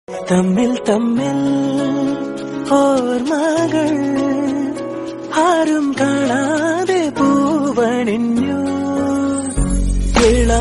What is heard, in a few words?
Malayalam song